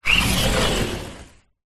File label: household window thin garage sliding glass